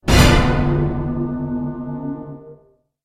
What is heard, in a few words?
scared